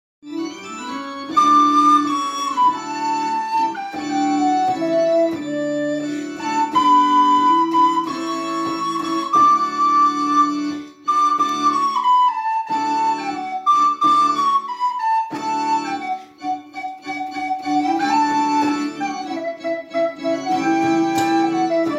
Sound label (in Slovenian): kljunasta flavta